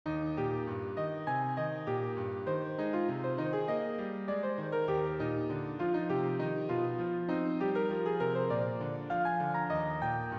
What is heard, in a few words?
hymn